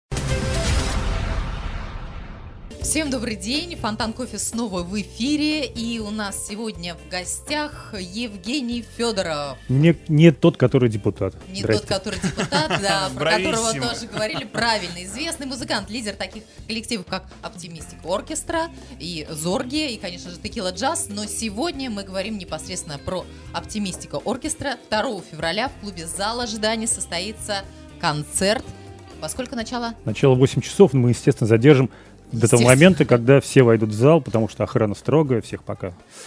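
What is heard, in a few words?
jazz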